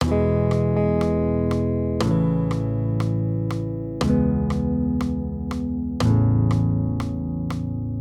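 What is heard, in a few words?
Guitar, Accompaniment, Major Triads, E-A, 120 BPM; maj5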